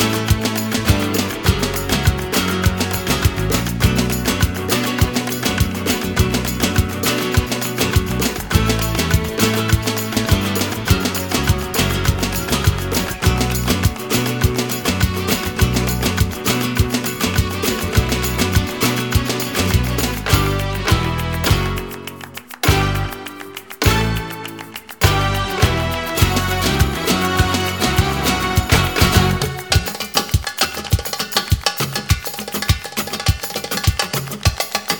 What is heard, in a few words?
Instrumental